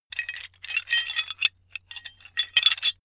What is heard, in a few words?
metal handling pieces sequence; atmosphaeren umwelt; baustelle